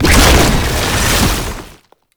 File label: Proto Infested Babau Passive Cast; Warframe; Abilities